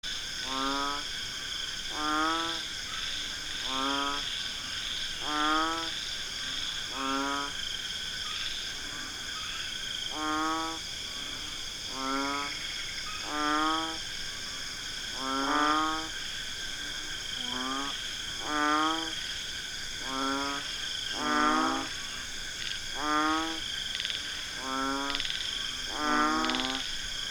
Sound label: Kaloula pulchra